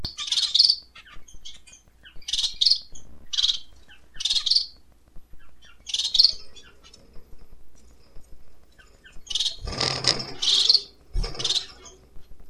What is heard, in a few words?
society hen call